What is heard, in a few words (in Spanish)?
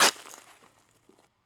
CAVAR HAZADA